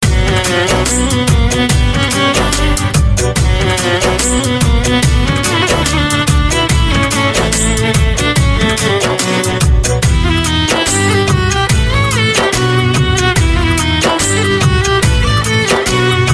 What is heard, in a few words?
Sad Voilin